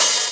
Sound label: OP Hihat; Cymbals; Open Hihats; VES1 Open Hihats